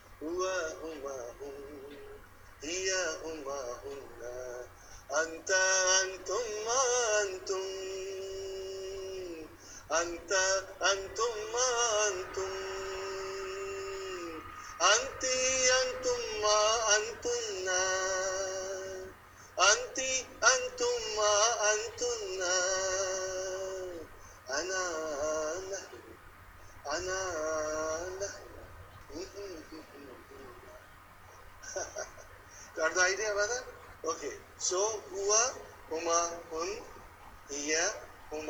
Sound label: song